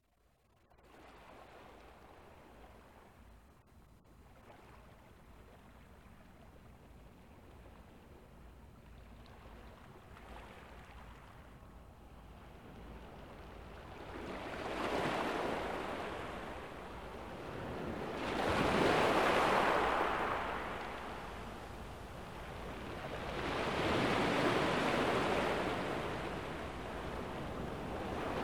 Meditation - Relaxation & Meditation with Music & Nature - Ocean; Ocean Shores